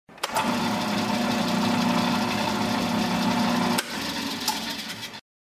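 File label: drill press; drl pres new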